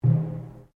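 Drum Taiko Cylinder Rope piano, Japanese cylinder taiko; Miscellaneous Musical Sound Effects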